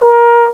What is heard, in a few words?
UPHONIUM; fairlight cmi